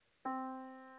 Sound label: Yamaha Disk, piano sound